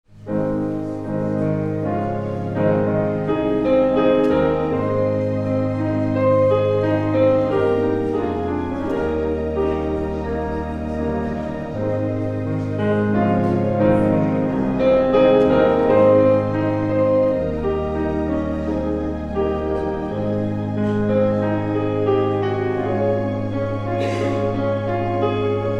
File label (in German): Vorspiel